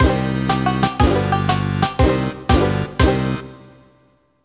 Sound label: Unused Jingle; Banjo Kazooie